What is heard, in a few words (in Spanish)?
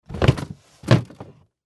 juguetes juguete